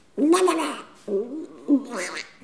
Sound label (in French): bruit animal